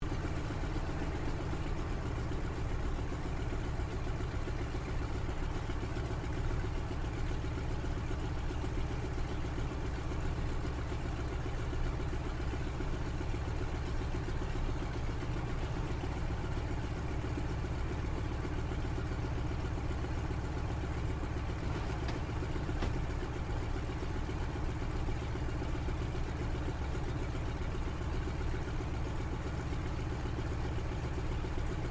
AIRCRAFT CAC WIRRAWAY IDLE INTERIOR; CA-16 Wirraway; Airplanes; Vehicles; Airplane Sound Effects

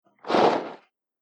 Horror Movement Crumbling Crypt Sounds; Scary Soundscape; Andrew Fleming

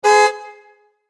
Auto Horn Honk Parking Structure Automobile Car; Vehicle; Cars

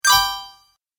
Message Alert, beep

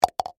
Golf Ball Hole, Sports, Golf, Ball, Into, Hole

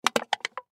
Aluminum Can Empty Crunch Short; Foley; Blastwave

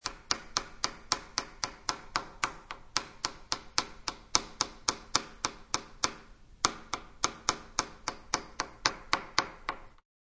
Pen Tapping, Tapping a pen against a table; Objects

